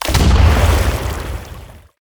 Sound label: e skill water cannon cast